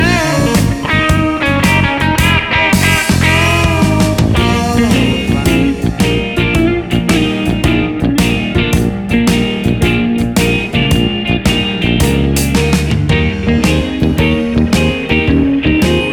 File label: Blues Bounce